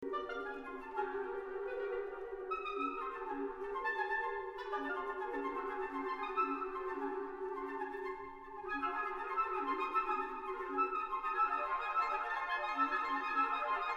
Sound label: Concerto for piano and orchestra